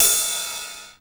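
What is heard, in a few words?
909 ride, TR-909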